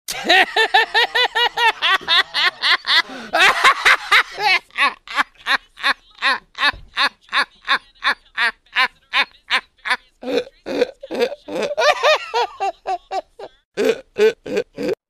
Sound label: long laugh